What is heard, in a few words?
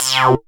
Synth Shot; Vengeance Samples